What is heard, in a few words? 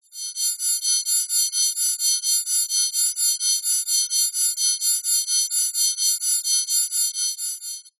sound design bright blinding light